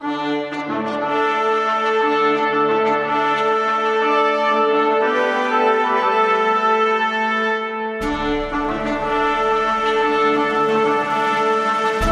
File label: Fanfare Long